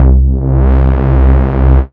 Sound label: Jungalow C3, Synth Hits; Zero-G; Soundclash Nu Dance Electro; Acidized